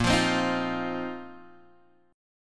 A sharp Suspended 2nd Suspended 4th down Guitar Open G